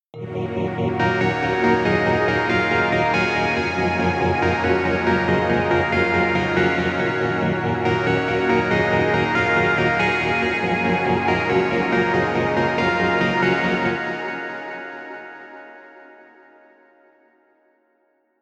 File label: Loop